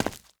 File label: Footstep Asphalt; this is the zodiac speaking 2020 gamerip switch ps4 xbox one pc macos